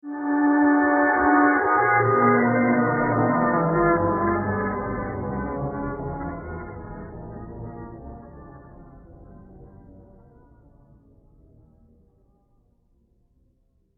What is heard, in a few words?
Descending Tones Musical Flashback Bad Dream; Ethereal Mystical Long Fade Horror Scifi Scie; Sound Design; Descends